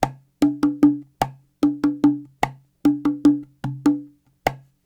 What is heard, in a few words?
Cahon